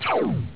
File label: gun; outpost